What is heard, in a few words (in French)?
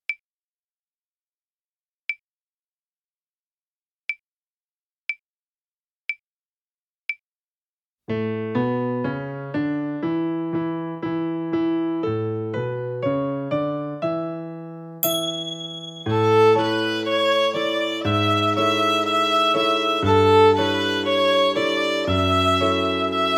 Alto